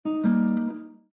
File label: Windows Notify; Windows 7 Sounds; Heritage